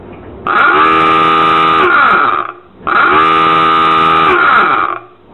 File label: H9 horn